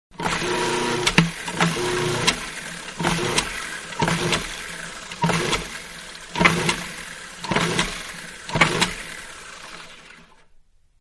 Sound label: Food Processor-Several Blasts; Food Processor 2; Food Prep; Restaurants; Manhattan Production Music